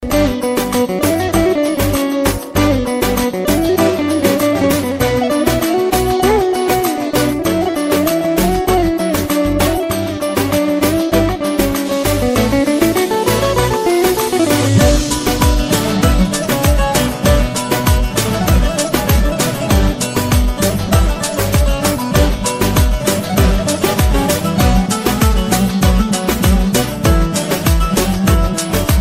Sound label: baglama ringtone